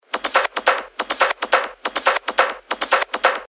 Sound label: 140 BPM, techno loops; Ayayai; Revealed Techno Top Loops Vol. 9